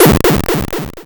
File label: Cry